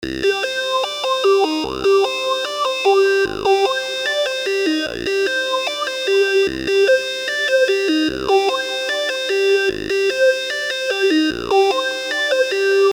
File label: Serum Lead